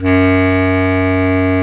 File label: WND BS CLAR, Bs Clarinet, Orchestral Winds